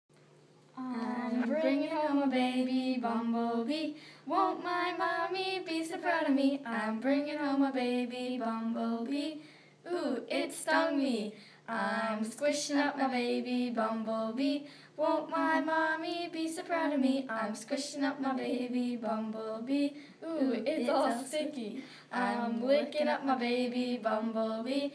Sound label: baby bumblebee